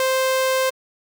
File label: saw